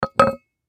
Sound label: Pickle Jar Set Down, Pickle Jar Set Down Cement Surface; Food; Foley; Household; Blastwave